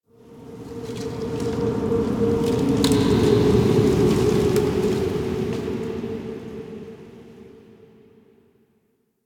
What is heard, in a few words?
Park Wind; Ambience; Warframe; The New War; Quests; Zariman